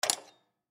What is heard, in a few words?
Coat Rack Hang Up, Coat Rack Hang Up Hanger; Dressing; Household; Human; Blastwave